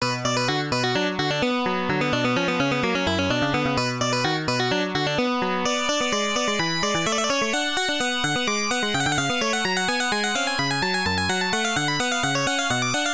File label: Piano; Doukyusei; FM Towns